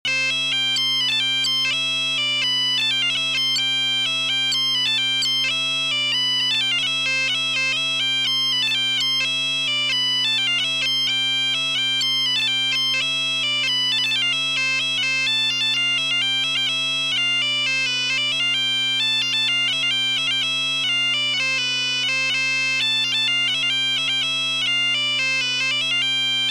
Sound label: galician open; technopipes